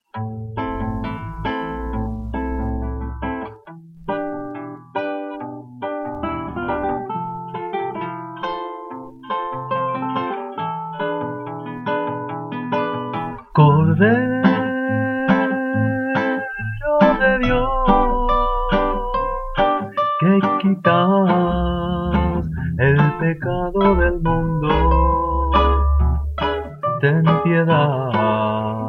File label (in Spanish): Cordero Reggae; cantos en experimentacion